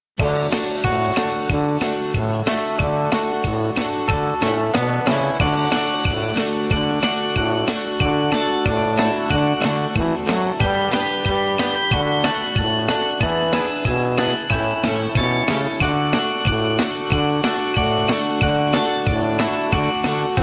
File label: Jingle Bells, Traditional karaoke, no lead vocal